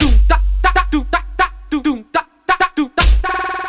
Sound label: Beat